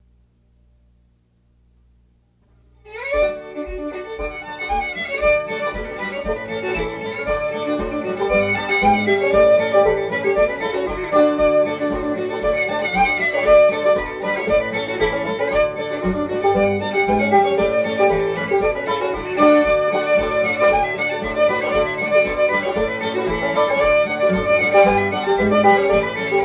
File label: Irish Hornpipe